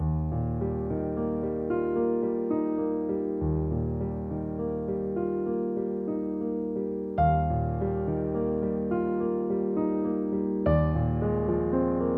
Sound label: Prelude in Eb major